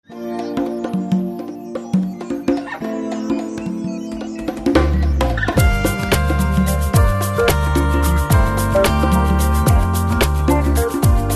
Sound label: guitar studio